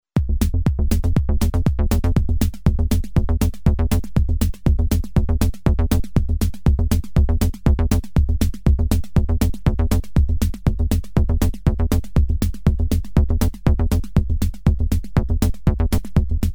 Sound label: Analog Bass Moog filter Thor BD SD ReGroove